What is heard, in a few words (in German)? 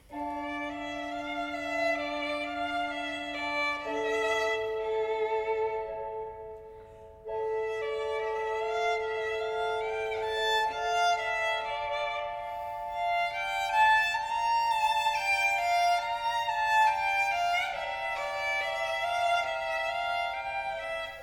Largo aus Konzert a-Moll; Vivaldi